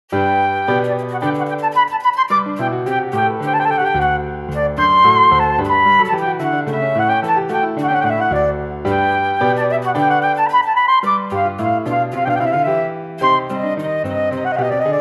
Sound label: Sonata for Flute